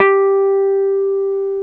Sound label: Jazz Guitar